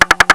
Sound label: knock beep; Sound Library 2000 - Wayzata Technology; Short Miscellaneous